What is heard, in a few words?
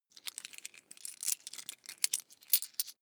coins clatter soft